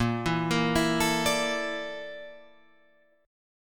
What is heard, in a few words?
A sharp Major 7th Flat 5th A sharp